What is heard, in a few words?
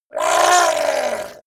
fishman shout pain hit